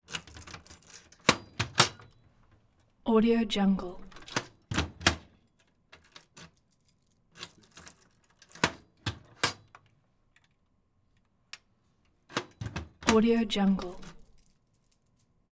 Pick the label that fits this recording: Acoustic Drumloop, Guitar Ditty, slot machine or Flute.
slot machine